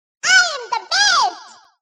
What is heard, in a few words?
sleep; toad